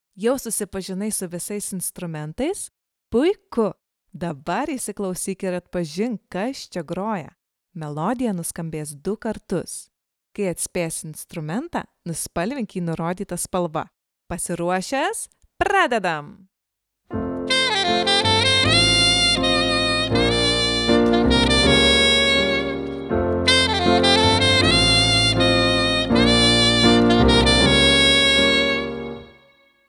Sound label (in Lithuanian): Saksofonas; Atpazink instrumenta